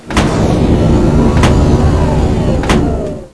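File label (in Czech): lanovka, cable car; pavouk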